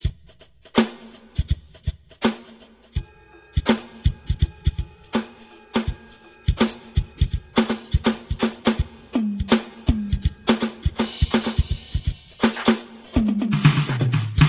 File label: rhythm